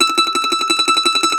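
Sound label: Mandolin tremolo, Plucked Strings; E-MU Producer Series Vol. 7 – Old World Instruments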